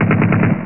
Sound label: heli snd; ST-XX Amiga Soundtracker Sample Packs